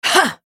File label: effort; Shyvana; en US